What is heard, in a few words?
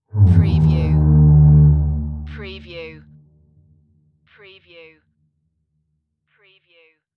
Fog Horn, Ship, Large, Short